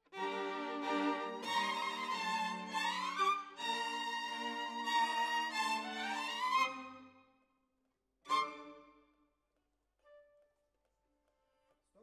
Violins, jazz for piano

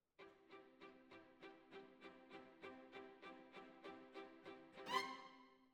Violins Over

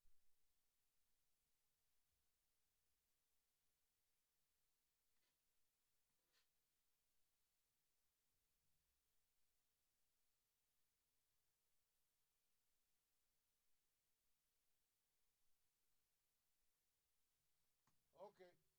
Floor

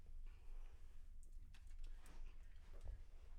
Children Choir; Studio Bekol Children Choir

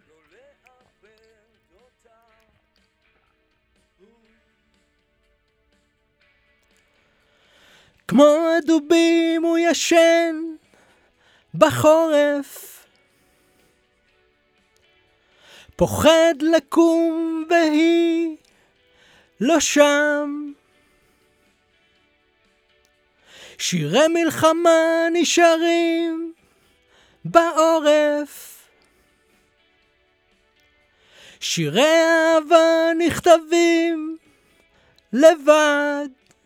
VOC

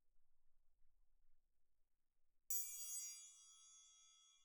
Perc

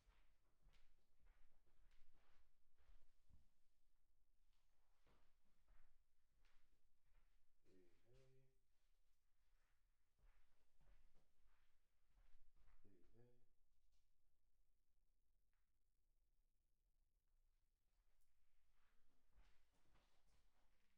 PERC OH

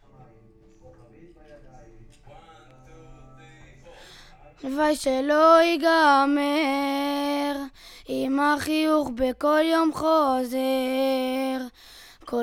VOC

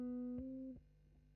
GTR B

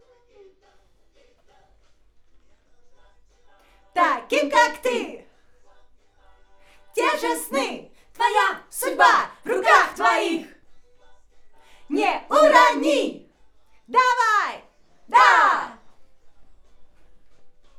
Schoeps AKG, piano

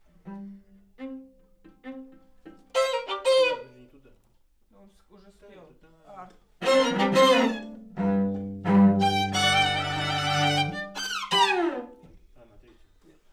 Cb Top

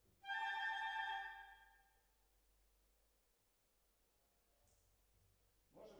Hall

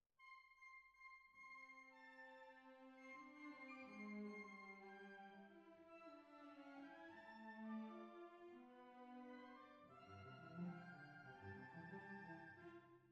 Cbasses

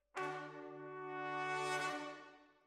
Sax

